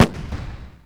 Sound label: Firework; New Super Mario Bros. Wii